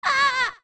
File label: Old Lady Shout; Old Lady shouting at Sakon (the thief)